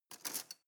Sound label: cutlery teaspoon drawer grab